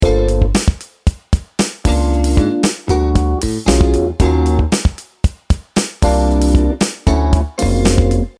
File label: Chords mM7 alt M7